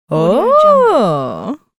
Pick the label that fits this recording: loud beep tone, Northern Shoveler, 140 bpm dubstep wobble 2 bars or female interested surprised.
female interested surprised